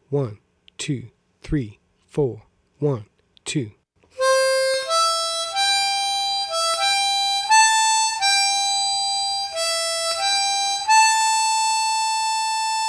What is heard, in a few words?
Fast; Michael Row The Boat Ashore